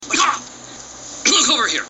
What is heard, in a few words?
Cough